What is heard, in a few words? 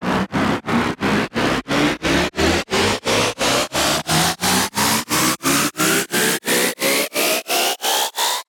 riser